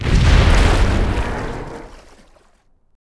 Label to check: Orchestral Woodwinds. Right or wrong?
Wrong